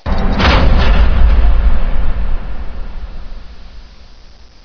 door lock

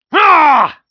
damage

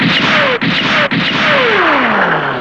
shoot

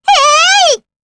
charge

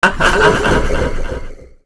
ice queen throw; npc

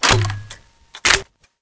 extend, weapons; law

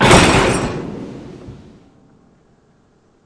car smack

